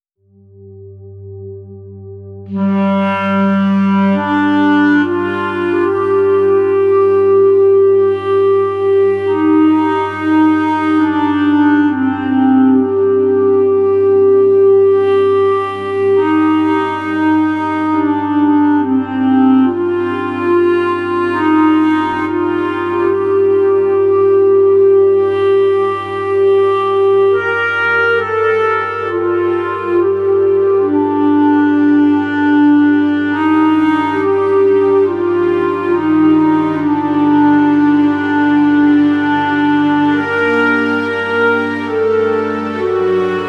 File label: folk music